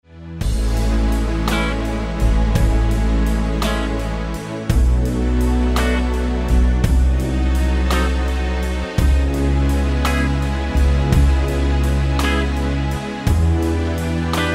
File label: smooth jazz jam